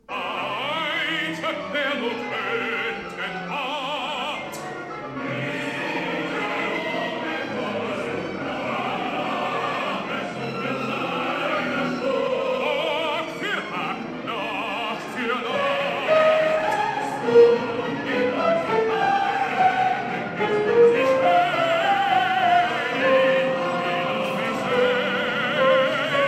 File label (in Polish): soprany; cardillac